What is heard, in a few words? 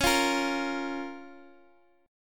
C sharp 6th C sharp down Guitar DADGAD